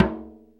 resonant drum; DRUMS & PERC

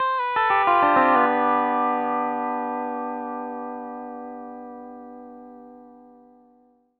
PD LOOPS 120, Whole Lotta Country

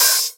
Open HH, Cymbals

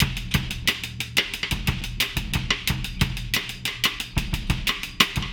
Process Beat, Hip-Hop Beats

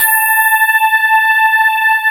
sparkles

